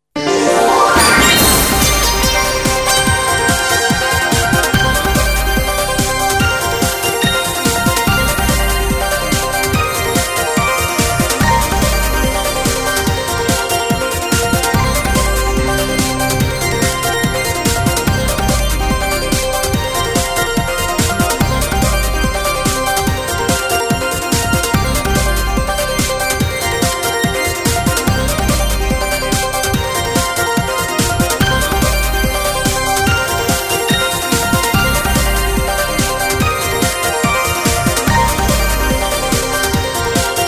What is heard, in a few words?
News Radio Tv